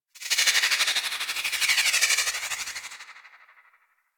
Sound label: Freeze Ray Laser One Off; Warframe; Weapons; Corpus